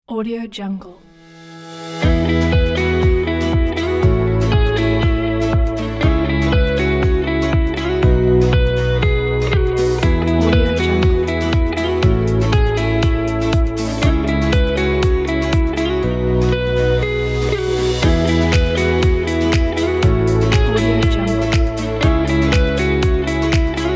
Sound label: energetic and upbeat pop corporate